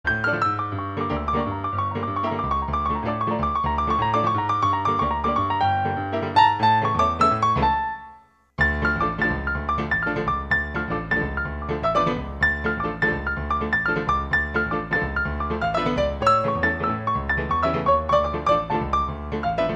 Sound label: samba